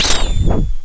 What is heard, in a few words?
shoe